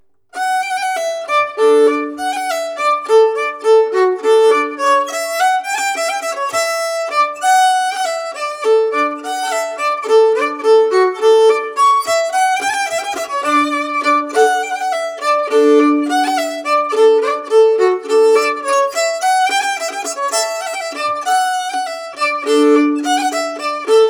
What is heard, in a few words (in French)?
Valse